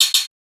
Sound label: hat rip, Closed Hat, Drum One Shots; SD MIDNIGHT Sample Pack; Slate